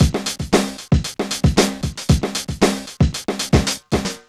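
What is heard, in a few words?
115 bpm, Funky loops; Zero-G; Funky Elements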